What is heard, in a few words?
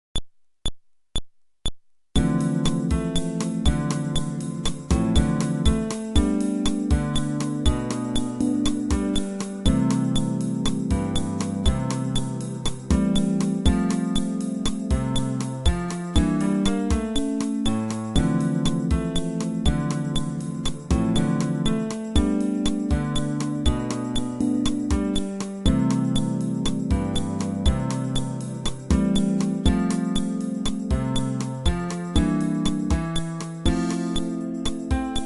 Groove, fretless bass